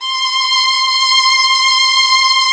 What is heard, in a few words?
TRON VIOS C4; E-MU Formula 4000 Series Vol. 3; Analog Odyssey